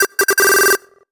MUSIC EFFECT Solo Chip Square Positive (stereo); startide macos switch windows gamerip 2017

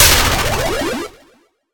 Player Death

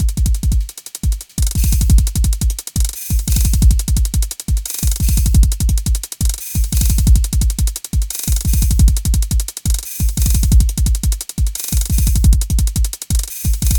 Hi Drm Machine; Infestcave

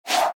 Fail

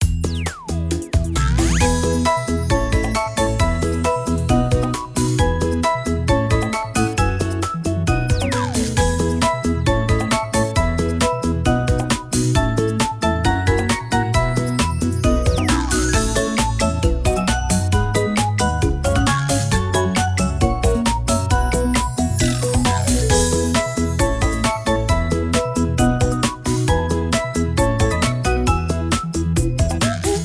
bgm lobby

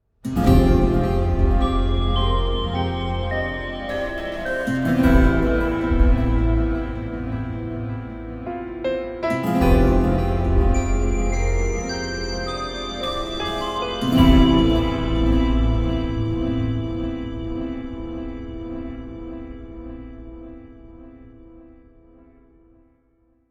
contemplative; Joe's Diner

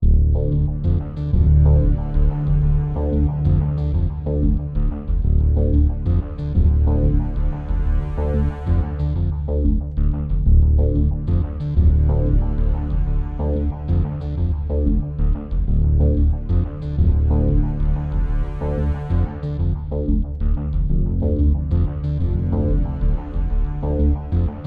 Alarming News noDrums; Revulsion

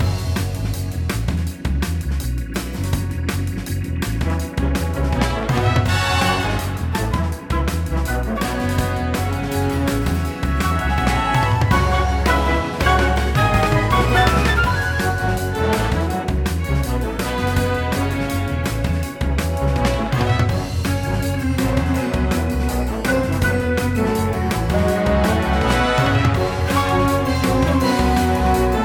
Level Loop; We Happy Few

